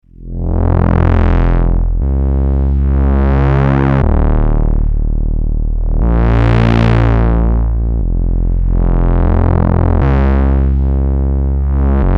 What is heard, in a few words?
Dark Energy Bass + Slow Mod